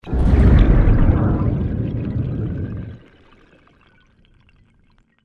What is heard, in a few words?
explosion underwater large stereo